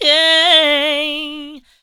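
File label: yeaaye, female vocals, left; PlatinumPhattCD2; E-MU Producer Series Vol. 8 – Platinum Phatt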